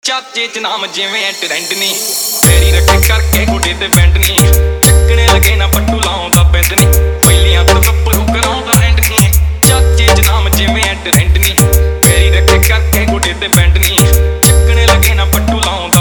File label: Remix; Dj Jatin Jtn Prayagraj